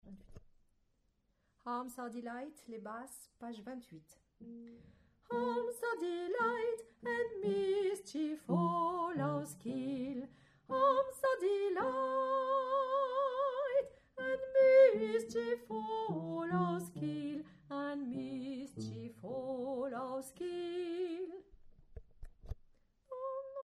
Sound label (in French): Basse